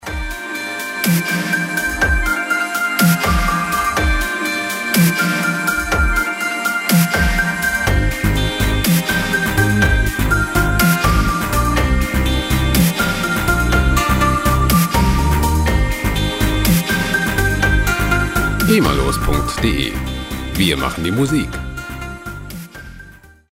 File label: phonk, rock